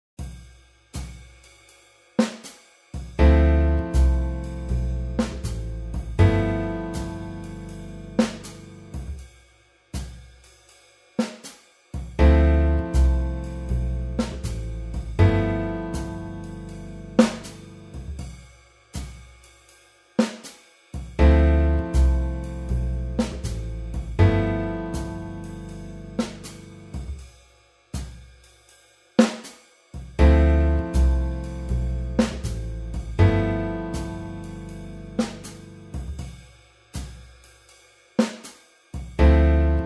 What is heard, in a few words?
Scale; Tomosax